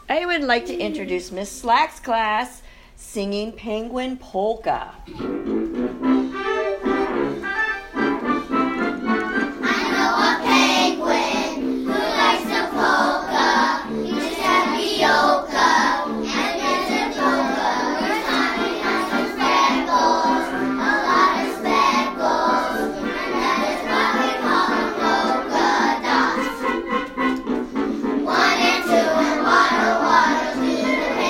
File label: penguin polka